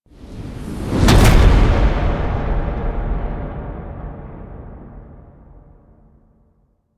Fist Slam; Courtyard Intro; Cinematics; Thrax; Warframe; Duviri Quest

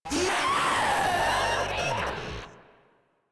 Proto Infested Runner Vocal Attack; Enemies; Vocals; Warframe

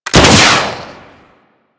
Skiff Missile Fire, weapons; Grn Skiff Missile

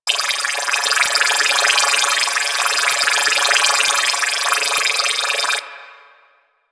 Distraction Drone Loop; DE; Warframe; Weapons; Archwing; Powers; Archwing Distraction Drones

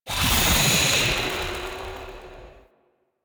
Fairy Sprint Thrust; DE; Warframe

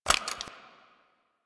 Grineer Assault Rifle Reload Handle; Weapons; Warframe

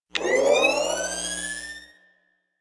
Rifle Recharge; Warframe; Weapons; Wisp Rifle

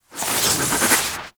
Melee Heavy Attack Small Charge; Warframe; Weapons; Whooshes

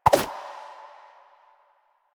Run Whoosh, Footsteps; Warframe; Lasrian; Lasrian Soldiers; Foley; Lasrian Butcher

